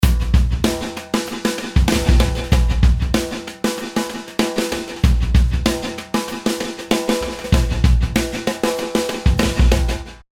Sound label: Drums Drum Remix Stutter Verb